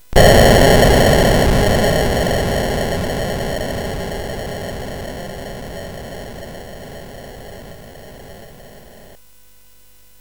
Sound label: Frantic, CSG8580R5